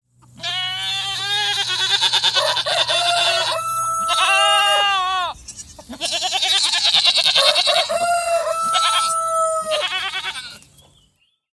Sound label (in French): duo chevre coq; loto sonore; loto des animaux